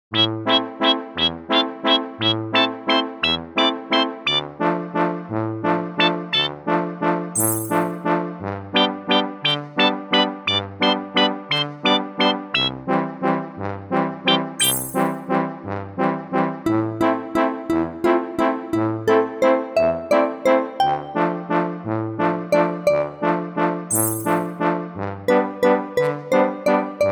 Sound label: Slapstick, COMIC